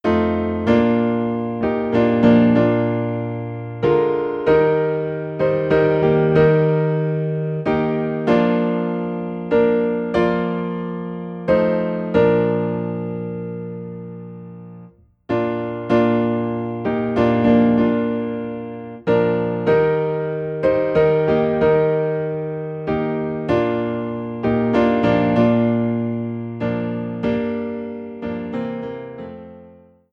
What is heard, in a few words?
Piano in B Flat; The Comforter Has Come